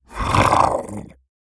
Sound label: Mush Room Combat Stand